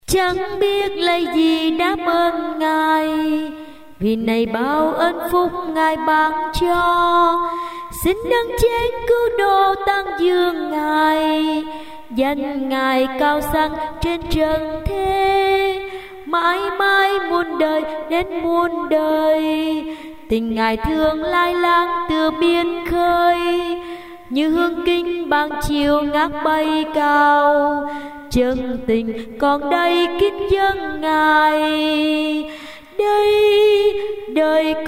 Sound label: sop alto